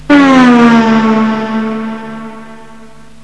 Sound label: AUTOBAHN